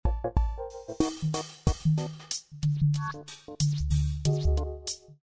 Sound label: Funky Hopper F7 93